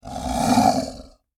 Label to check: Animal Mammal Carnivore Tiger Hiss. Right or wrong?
Right